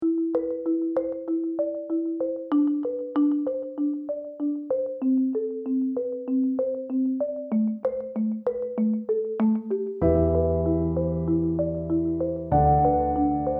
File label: Bleak Marimba Duo